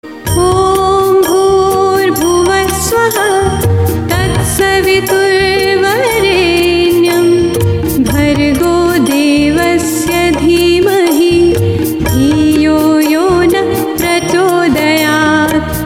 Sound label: gayatri mantra solo